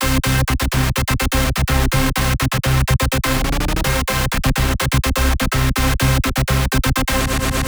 Stack Break, звук синтезатора брейкбит

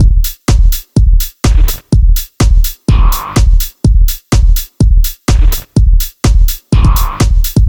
сэмплы ударных дэнс электро; Radio

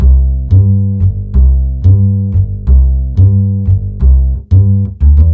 бас сэмплы легкая музыка; Circle

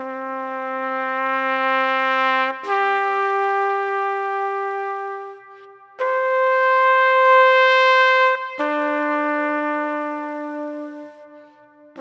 Trump Swell, сэмпл трубы эмбиент trumpet swell

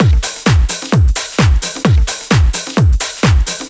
Niner Beat, сэмпл ударных евродэнс

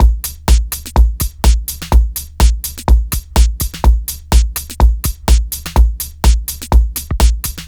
сэмплы ударных дэнс электро; Shoemaker

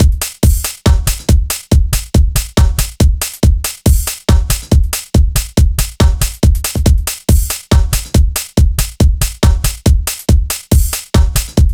Compression D, сэмплы ударных техно транс

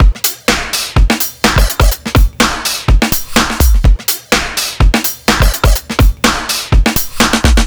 Bell Kit, сэмпл ударных брейкбит

